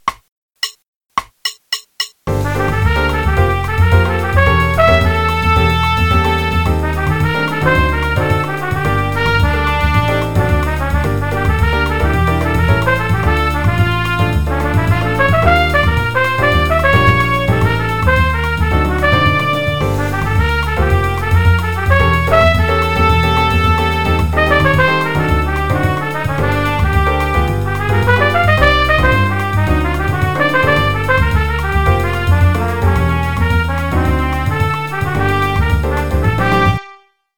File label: Jazz Trumpet Audition; 2018 CBDA CAJ; qn=219